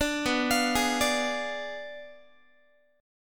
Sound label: B Diminished 7th B down Guitar; arpeggiated; tuning 4A,4E,5A,5D,5G#,6C#